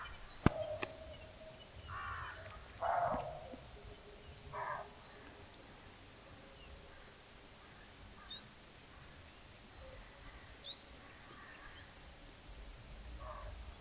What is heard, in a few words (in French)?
chevreuils, dévoluy